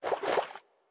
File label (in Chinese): 海龟游泳声